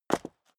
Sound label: step high heels concrete grit single stair down; schritte; Footsteps; menschen